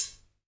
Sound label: HH, HIHAT HIP; Best Service Dance Mega Drums